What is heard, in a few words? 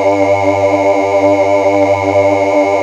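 VOX G1, CHOIR; TOMVOX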